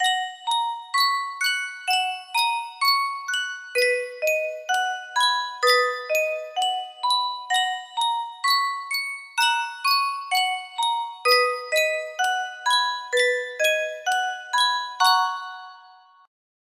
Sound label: Yunsheng music box, music box melody